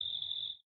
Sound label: night insects; factorio